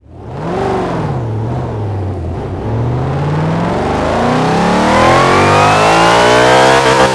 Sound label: first, vehicles; shelby1k; gmod; tdmcars